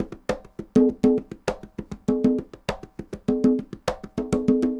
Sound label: conga 100 bpm, latin; Sound Effect Library